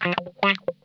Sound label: COARSE RIFF, Funk Guitar; Zero G - Funk Guitar